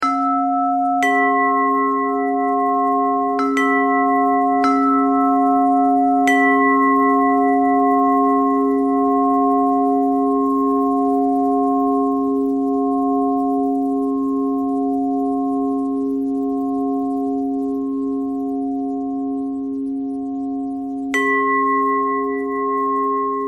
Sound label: bell thread pentatonic